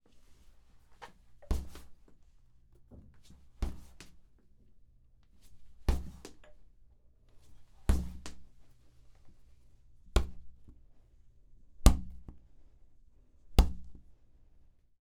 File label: Kicking Soccer Ball Shoe